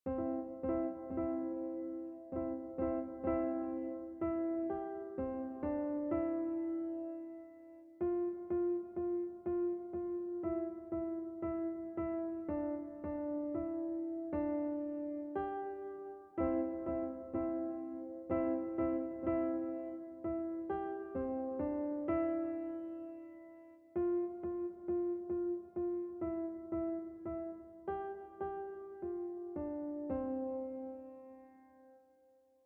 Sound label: Jingle Bells